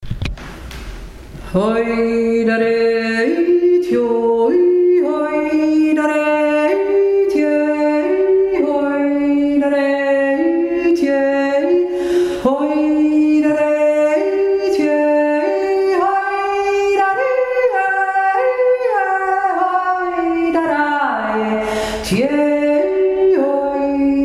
l erabrett 1stimme

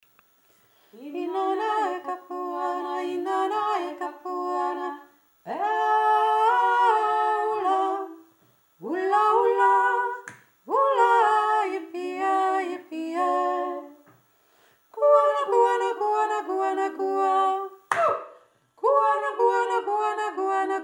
kapuana hohe stimme

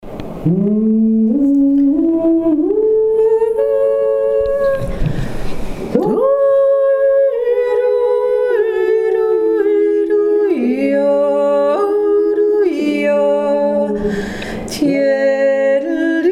s dtiroler dui oberste stimme

